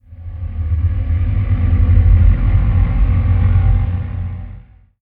Hum Dark Ooh Swell

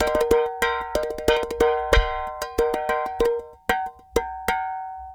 Clay Pot, loops; marcanderson

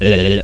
ulululul

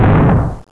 mine explosion far; Bolo; Arcade Games

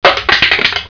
CRUSH, robots

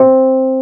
Hard EP E3; SoundFont - The Developer's Choice - Volume 1

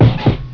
jump; QuadMire 1.0; Quagmire

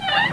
squeaky; Sound Effects for Multimedia - Volume 1

